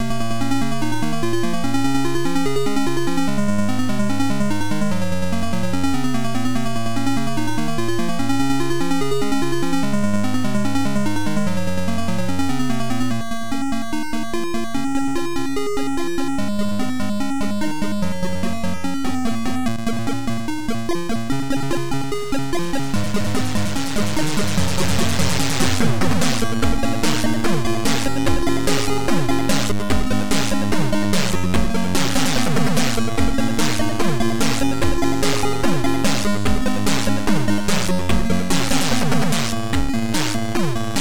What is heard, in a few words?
Techno